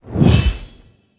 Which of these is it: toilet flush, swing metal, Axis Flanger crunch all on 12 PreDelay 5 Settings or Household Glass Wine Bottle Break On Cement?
swing metal